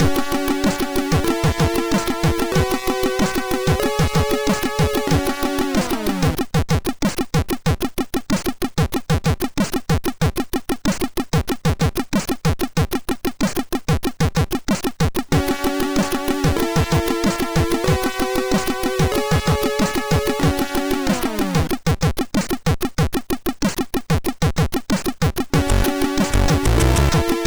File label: tune, sid MOS6581R2; Digital Zakx